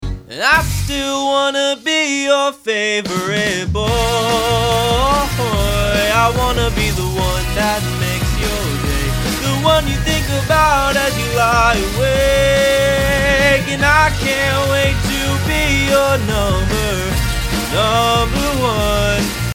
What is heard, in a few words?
Vocal Pop; Vocal Reel